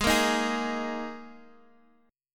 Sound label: Ab Suspended 2nd Ab, Guitar Drop D; x,x,6,3,4,4; down